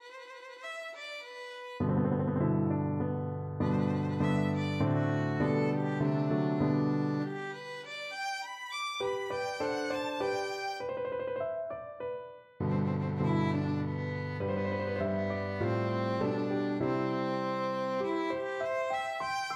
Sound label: Violin Sonata first movement; Beethoven; Op 96; bars 1-22